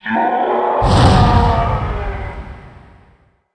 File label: menace level hologram skull; Earth and Beyond